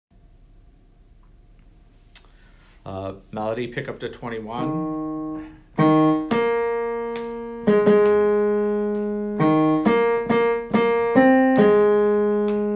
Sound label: MELODY